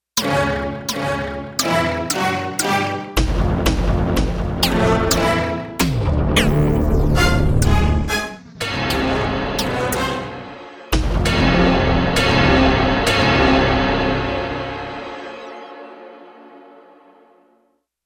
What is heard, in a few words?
FX Menu